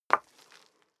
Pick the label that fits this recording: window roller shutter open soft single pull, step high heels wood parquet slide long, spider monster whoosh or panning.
step high heels wood parquet slide long